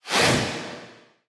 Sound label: Attack Swish; Portal Quest; android; SFX Necromancer Attack Swish